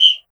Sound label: PERC, Drum & Bass, 150 BPM; Ueberschall; Drum & Bass Flash Trackz